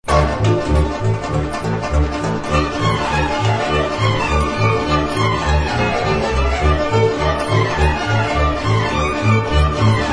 Arcanul, Roumanie

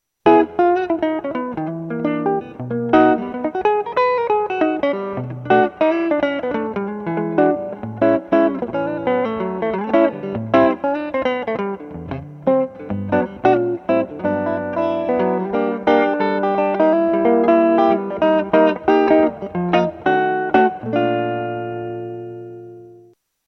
instruments guitare electrique a caisse creuse

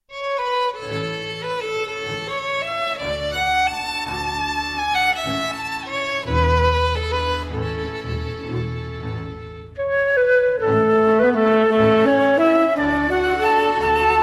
Spanisch Walz, Angleterre

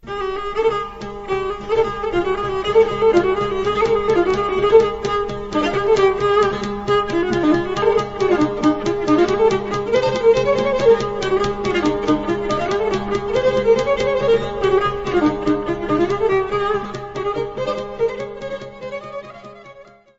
Malevisiotikos, Grece

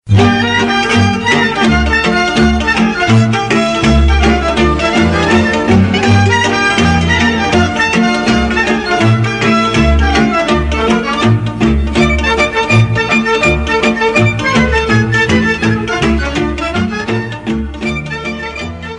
Hora - Roumanie

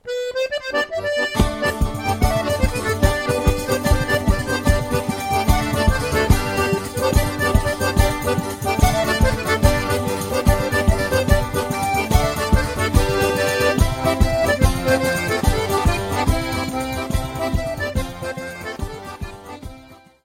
Tarentelle - Italie - Sicile; Tarentelle Maggiore

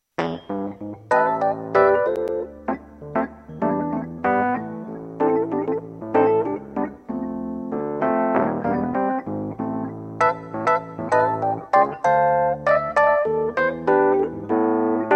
piano electrique; instruments

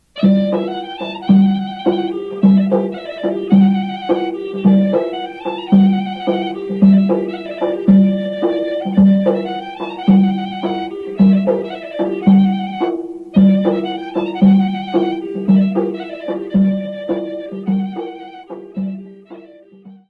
Gaida - Grece

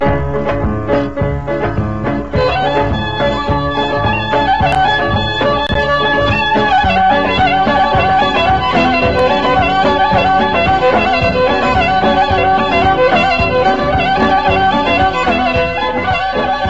Horunu, Grece

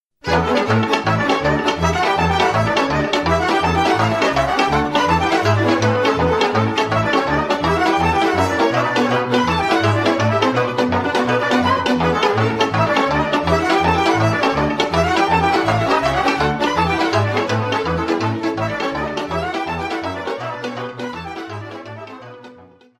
Sirba - Roumanie; Sirba de la Rosiori

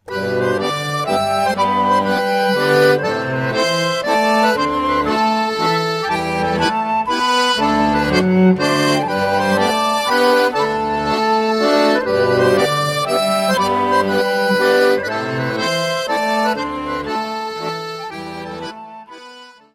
Mazurca, Italie

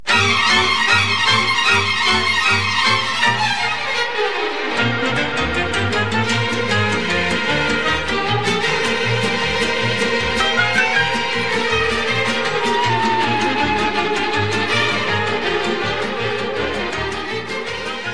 Hora Staccato - Roumanie

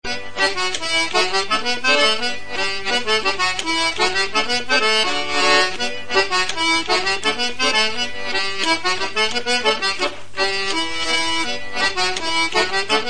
Scottish, France; Scottish du Porion

